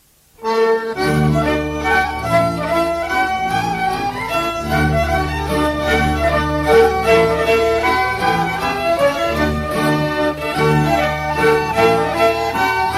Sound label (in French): Rheinlaender - Danemark